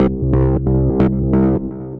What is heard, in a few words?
Aeroplane Pulse, Tense Pulses; Ableton; Mood Reel